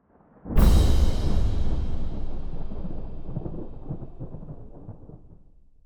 Thunder Hit; BlueFX; Bonus - FX & Transitions

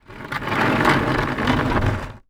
Foley Movement Wood Slide On Dirt

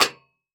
Foley Footstep High Heels Jump On Metal Platform; Foley Footsteps

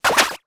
Multimedia Internet CD-Rom Flash Radio Element

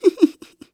Cartoon Human Male Giggle

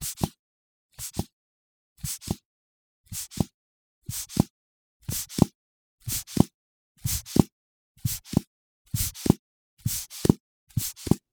Sphygmomanometer Pumping; Emergency Effects; AdobeCC

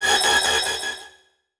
Multimedia Internet CD-Rom Flash Animation

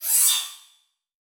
Animation Short; Adobe; Multimedia Internet CD-Rom Flash

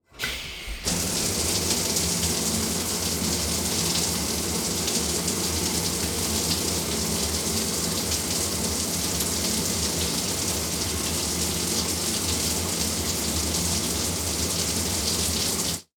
Household Shower Turn On Water With Door Open

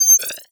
Animation Electronic

